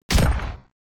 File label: hit; EMXArms